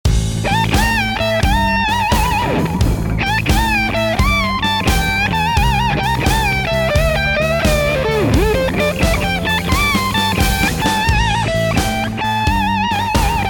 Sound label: Helix Electric Light 9 42; Dean Markely Helix Electric